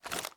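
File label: assault rifle reload extended magazine start; gmod; viper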